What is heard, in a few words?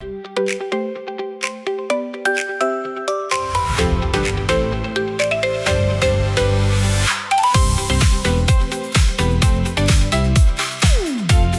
loop